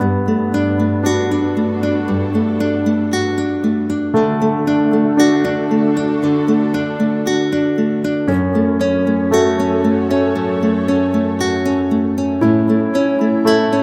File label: Guitar